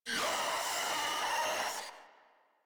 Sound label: Heavy Bark; Enemies; Vocals; Warframe; Proto Infested Ancient